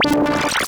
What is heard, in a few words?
kawai k1 wavetable; wavetables